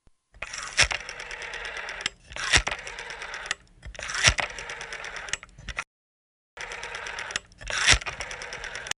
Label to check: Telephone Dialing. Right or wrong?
Right